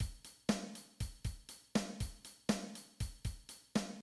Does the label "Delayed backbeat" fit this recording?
Yes